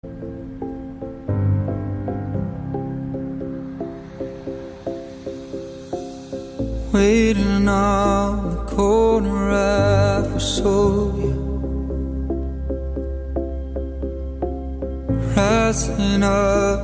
electro

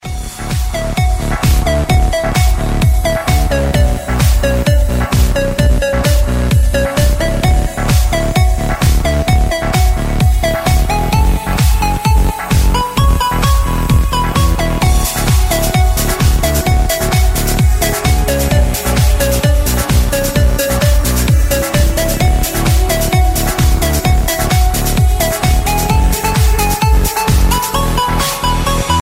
electro ringtone

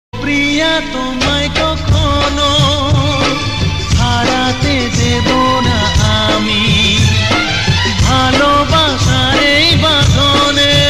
romantic bangali

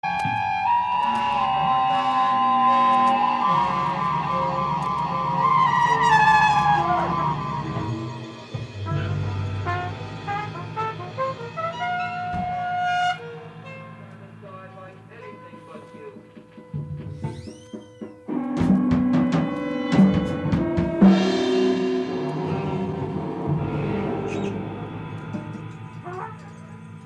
flute tune; belgium

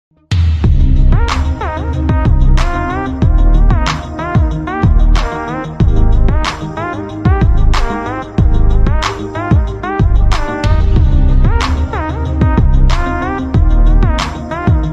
instrumental

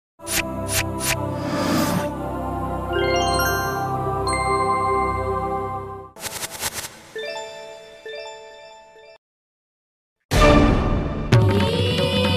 cartoon, ringtone; chota bhem

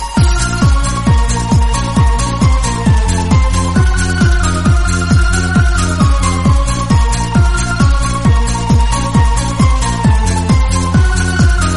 sicilian italian tarantella tune

